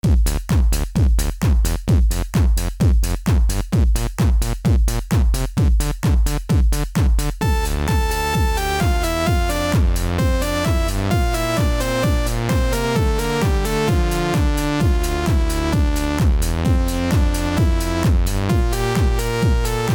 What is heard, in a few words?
rave, music; project hawk